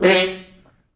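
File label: French Horns stops, F#2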